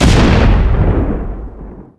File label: explo; Pacificwar